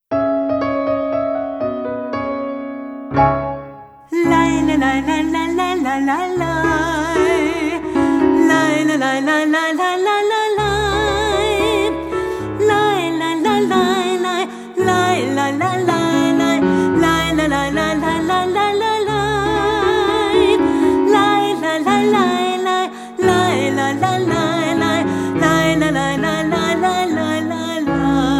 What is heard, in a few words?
Barchu; Bar Bat Mitzvah